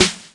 snare high noise paper, Classic Chicago House Drum Hits